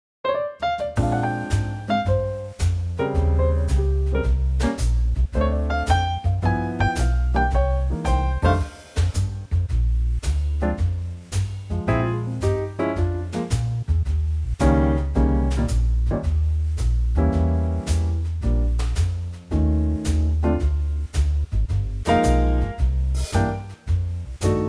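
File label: B flat trio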